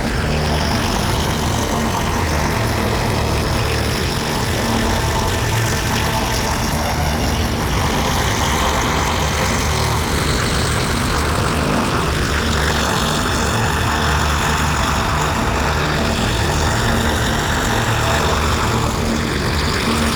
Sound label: Focus Loop; Warframe; Madurai